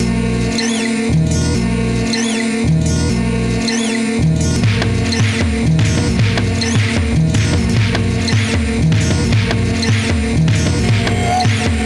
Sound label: good feel, Vaporwave; Vaporwave Library Project